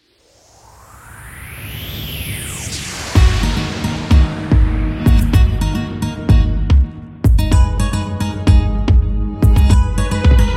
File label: Rap Farsi